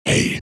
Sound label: Monster Grunt